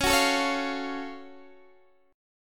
Gb Minor Major 7th Db

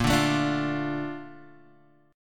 A sharp Major 7th; A#M7; strummed; JGuitar

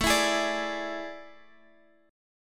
A Major 7th Suspended 4th, Guitar, Open D

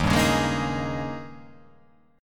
D sharp 11th

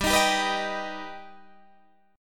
G Augmented G Guitar Open D

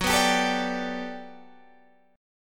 G Major 9th, F sharp

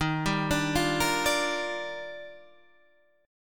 Eb Major 9th, Guitar Half Step Down

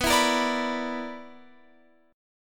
B-Minor Major 7th Double Flat 5th, Guitar Open F; BmM7bb5; strummed

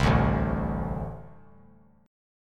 A Minor Double Flat 5th, down; strummed; JGuitar

